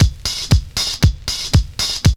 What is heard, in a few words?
break; Zero G - Man Machine